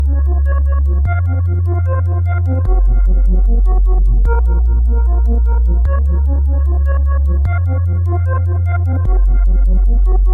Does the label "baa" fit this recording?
No